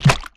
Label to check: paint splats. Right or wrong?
Right